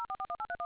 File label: dtmf